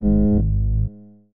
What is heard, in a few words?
outro